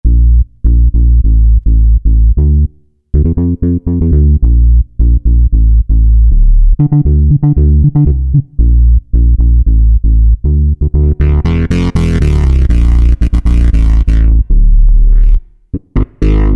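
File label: Dance Bass, Roland V-Synth; Factory Patches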